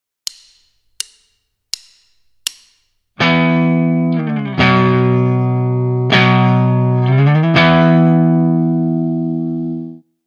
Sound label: slide & hit, Rhythm Guitar; Basic Rhythm Guitar II - "rock it!"